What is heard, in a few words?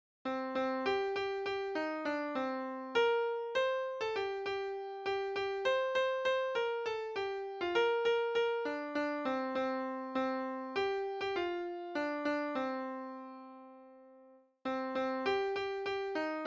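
piano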